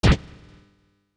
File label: Sword Hit